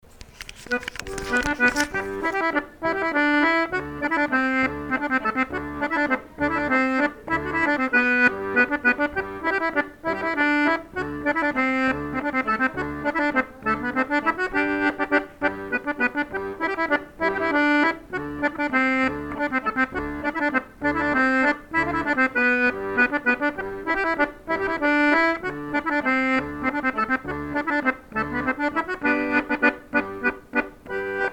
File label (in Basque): euskal gazteak dantzan; rencontres-2009